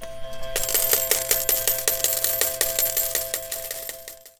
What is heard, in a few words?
payout